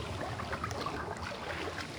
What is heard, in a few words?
pool loop; Soldier Of Fortune II Double Helix; XBOX; ambience